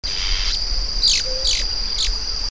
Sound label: Drymophila malura nasal